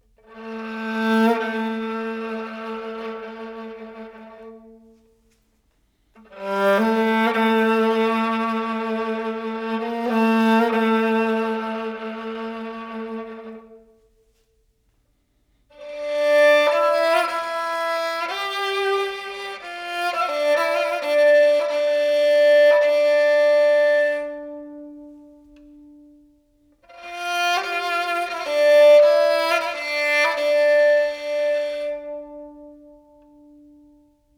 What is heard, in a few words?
kabak kemane